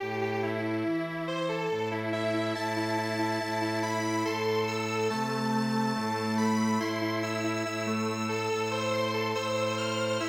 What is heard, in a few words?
string; itsuki